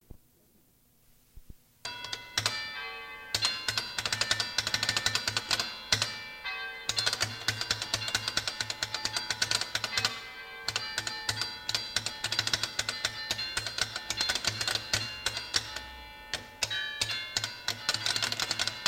Campane, Pieve di Teco; Imperia; Liguria; Archivio Balma Campane Liguria; Polifonia

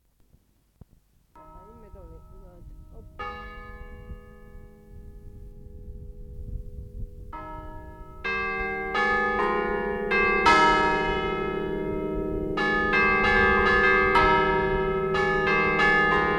Campane, Tavarone; La Spezia; Liguria; Archivio Balma Campane Liguria; Polifonia

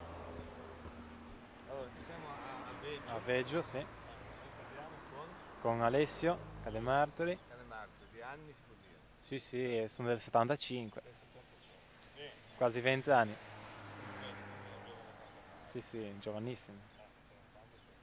Aveggio, Campane Liguria; Genova; Archivio Balma Campane Liguria; Polifonia

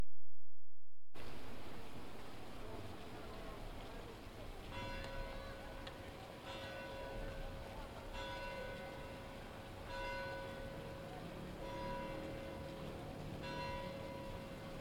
Sori, Campane; Genova; Liguria; Archivio Balma Campane Liguria; Polifonia

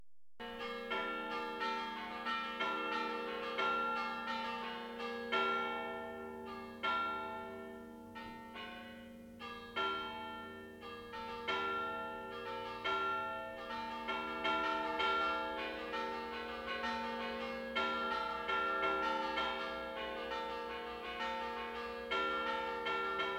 Campane; Teviggio; La Spezia; Liguria; Archivio Balma Campane Liguria; Polifonia

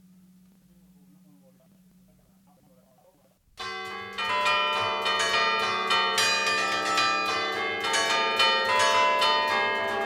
Genova Coronata, Campane Liguria; Archivio Balma Campane Liguria; Polifonia

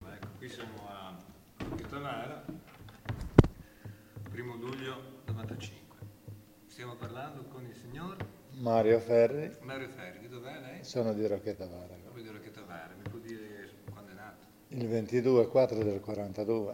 Campane, Rocchetta Vara; La Spezia; Liguria; Archivio Balma Campane Liguria; Polifonia

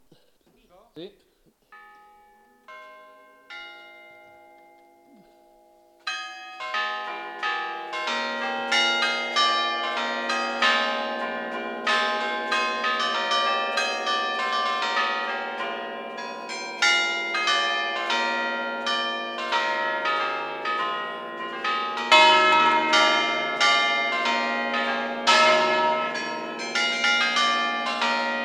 Isoverde, Campane; Genova; Liguria; Archivio Balma Campane Liguria; Polifonia

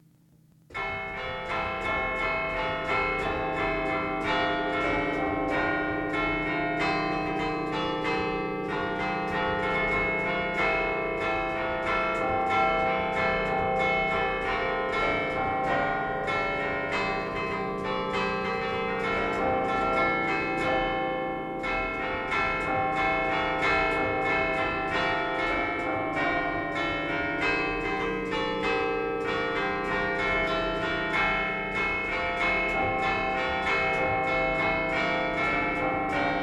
San Cipriano, Campane Liguria; Genova; Archivio Balma Campane Liguria; Polifonia

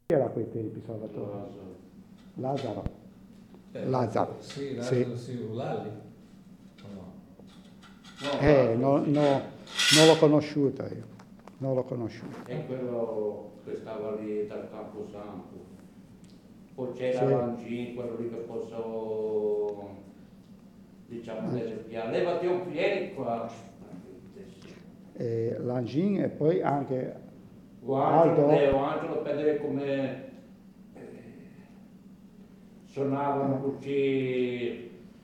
Genova Molassana alta, Campane Liguria; Archivio Balma Campane Liguria; Polifonia

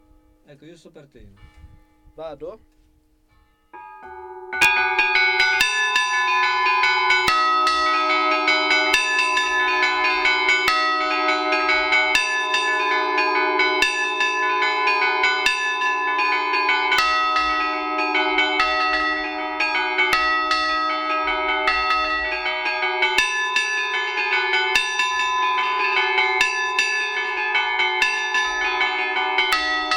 Campane, Glori; Imperia; Liguria; Archivio Balma Campane Liguria; Polifonia

